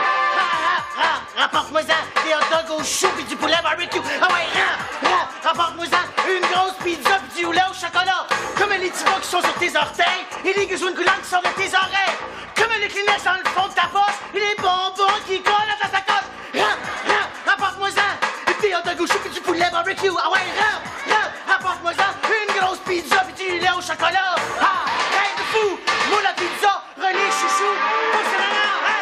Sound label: rap